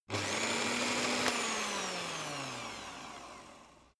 electric whisk rev; haus-garten; kueche

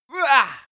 male hit

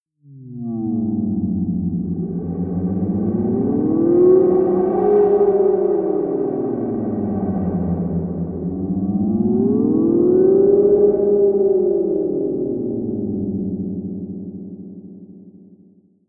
swaying drone

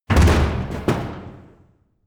crash large metal box; objekte

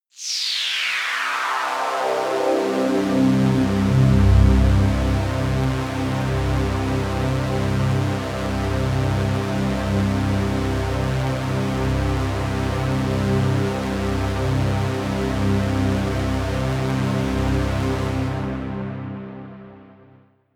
pad sweep sizzle; flaechen

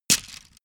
bamboo small drop; objekte; holz

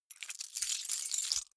key ring large rattle hard; objekte; schluessel

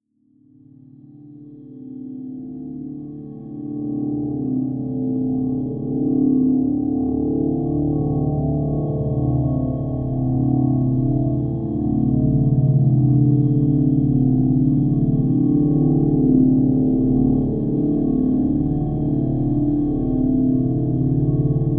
drone scratchy droner

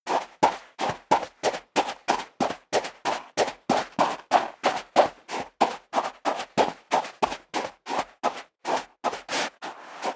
step leather shoes sand run sequence; schritte

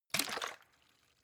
bottle water upend, gastronomie; berufe

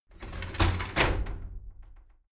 shower slide door inside open heavy

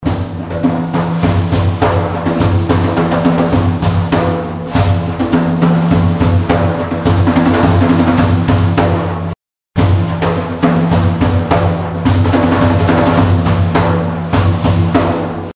oriental percussion; music elements; grooves

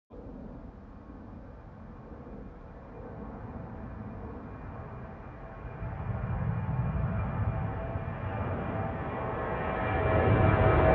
plane flyby land tonal; flugzeuge verkehrsflugzeuge; verkehr transport

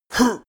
male hit huh; menschen; schreie

